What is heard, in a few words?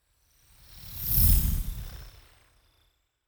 fly by insect drone long; swooshes-swishes